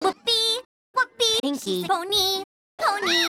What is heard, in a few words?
vocals, 130BPM; Pony Fan Music Remix Stems